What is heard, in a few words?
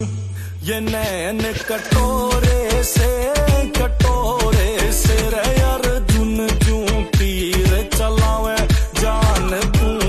Ringtone